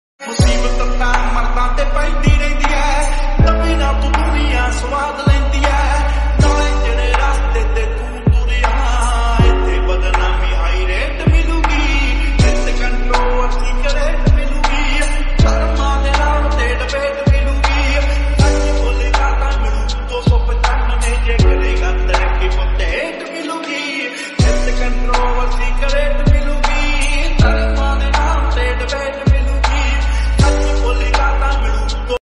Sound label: lofi punjabi song ringtone